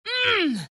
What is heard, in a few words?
grunt; dota; legion commander